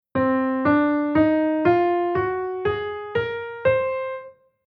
C Aeolian b5

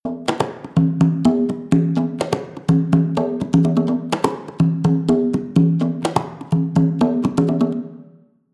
Reverb Room

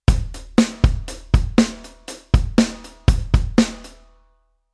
Rhythm 8 beat 2 bar

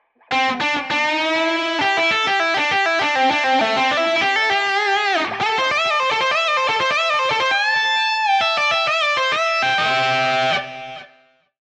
guitar pattern 2 gt

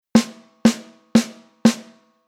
Rhythm Drum Set Basic SD

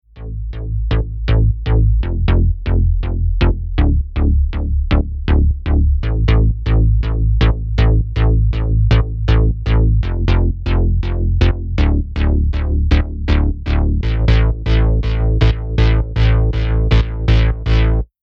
Synth Prog House Bass

